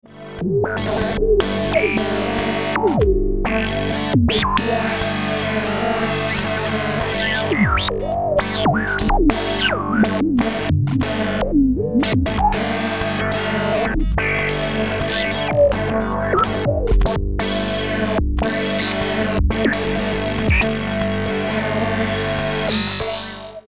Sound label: Noise